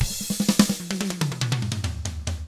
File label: HARD ROCK, Drums; 96HARD ROCK